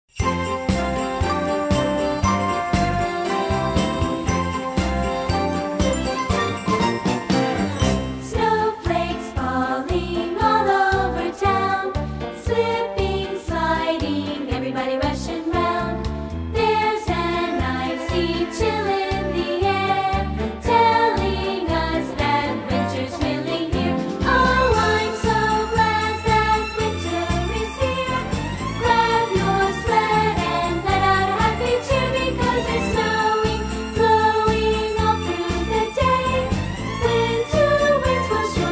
Winter Fantasy snowflakes and jingle bells Children Choral Song; winter concert